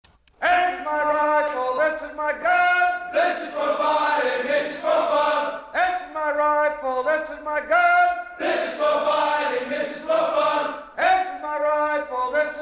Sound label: Rifle Chant